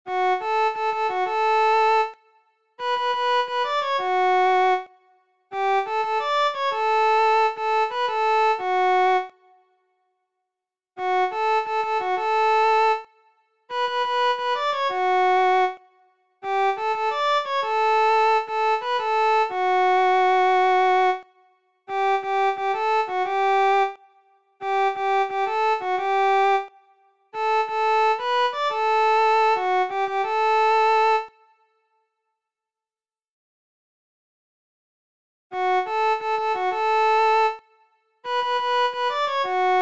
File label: Violin